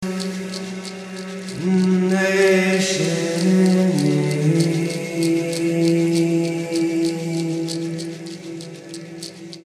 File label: A Kapella